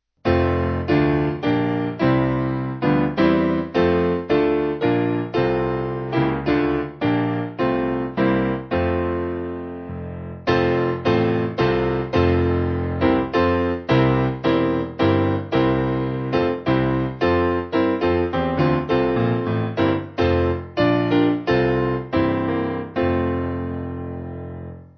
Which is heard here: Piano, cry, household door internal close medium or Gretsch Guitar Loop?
Piano